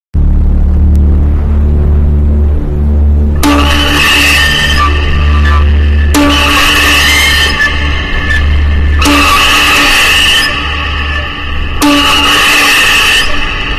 horror movie bgm; asvins; tamil movie ringtone

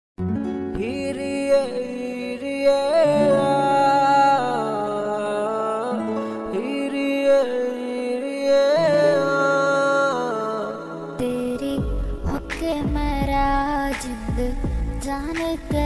singing hindi song

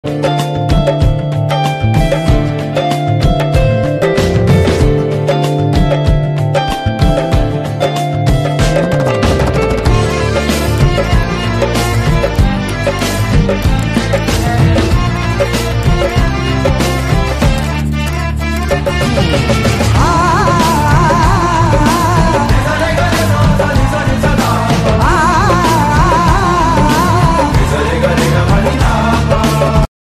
love song background music movie ringtone; ismart shankar

